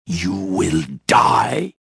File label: anger; reborn